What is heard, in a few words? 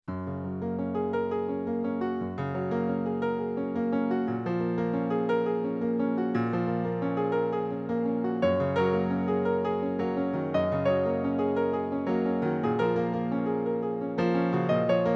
Gb Major